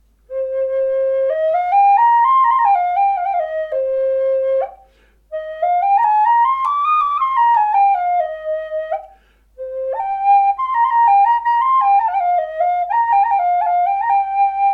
C sharp 5 a432 Maple Purpleheart, Native Style Flutes; C#5 a432; Maple over Purpleheart; Verdi